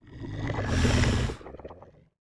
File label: Lizard Man Knight Idle; Seer; GamigoNA